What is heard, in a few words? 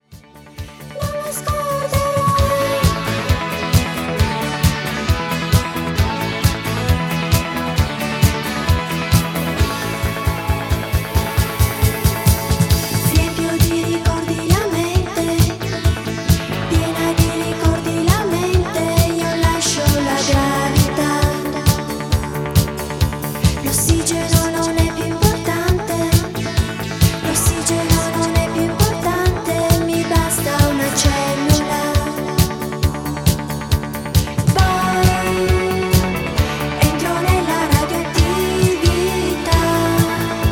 drums